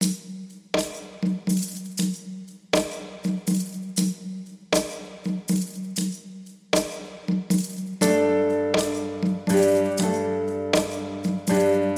summer story calm

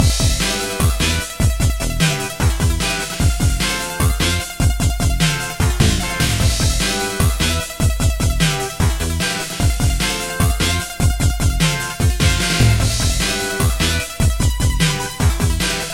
cyberpunk normal battle normal

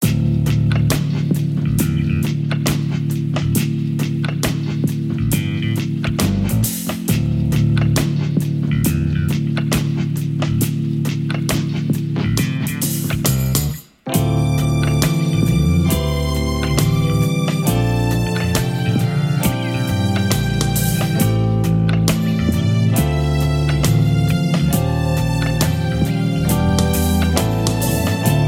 story sad

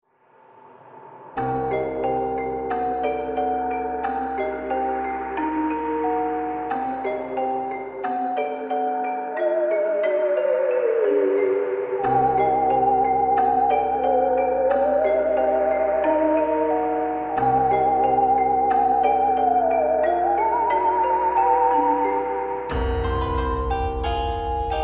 halloween story unrest

